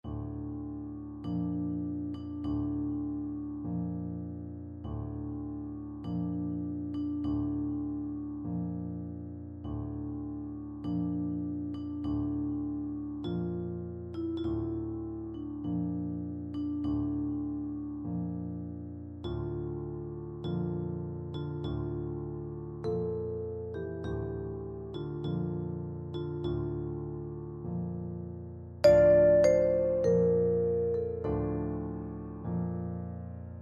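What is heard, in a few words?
funeral march vibraphone; chopin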